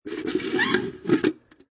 Straw Remove, Straw removed from soft drink cup plastic lid